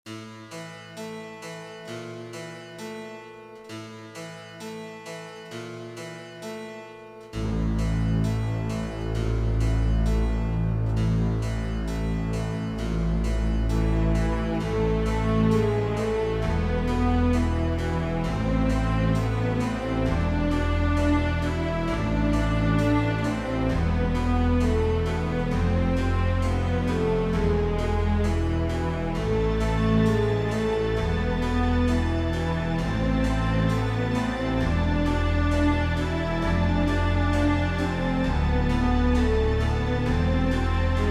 Piano Ambience; d.i.r.t. origin of the species

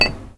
Impact glass light

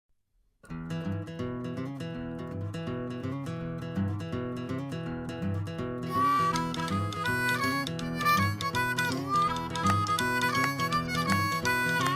Shuffle Train Blues; gamerip

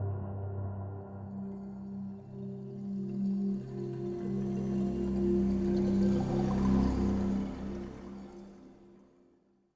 Fairy Unlock

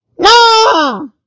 Tail poke

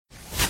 Skull Shoot; Doodle Jump; 3DS, Android, Arcade, DS, iOS, Mobile, Xbox 360

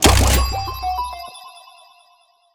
lose slot machine; gamerip; Pachi Pachi 2 On a Roll; PS4, PS5, Switch

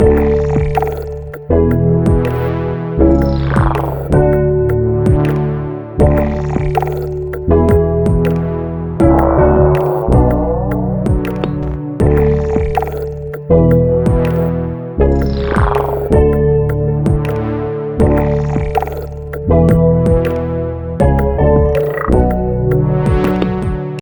stem bridge; Pix the Cat

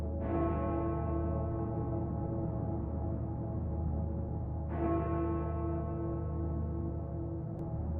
Bell Chime Ambience; Lethal Company

